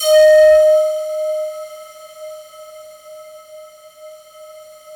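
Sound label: FOG ATTACK D#2 Left, Yamaha VP-1; Music Store; 90's Sample CDs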